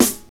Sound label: crunchy snare drum sample a sharp key, snare one shot tuned to the A# note